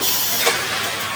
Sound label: BASE, ELECTRONC